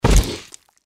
deathclaw foot run, npc

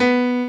piano

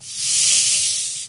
chatter, wraith; doom3

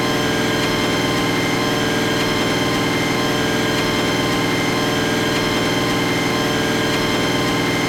tank gunner move

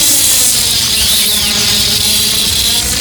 lightning loop storm

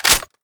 mag in, weapons; l85a2

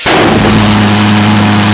pulse jet small, engines; acf engines

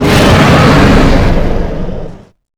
dragon shout long

